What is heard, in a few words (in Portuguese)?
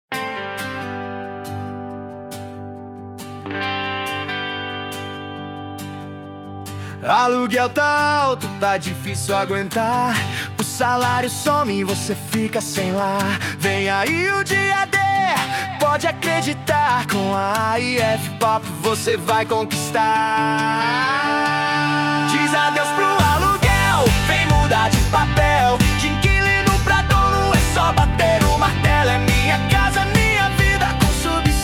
Pop rock